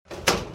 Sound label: close, door; shkaf; garrysmod